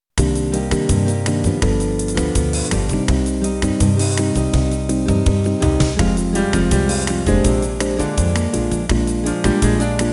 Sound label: Jazz